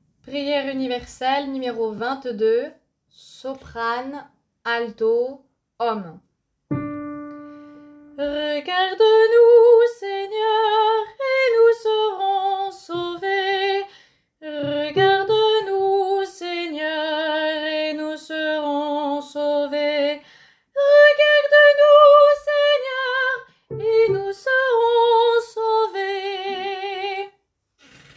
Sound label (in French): Prière universelle SATB